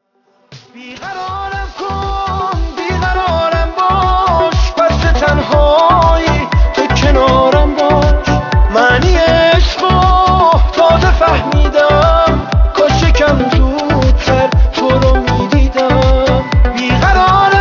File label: Mobile Ringtone